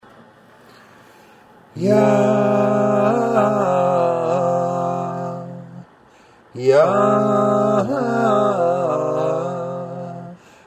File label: voice arranged, melodyne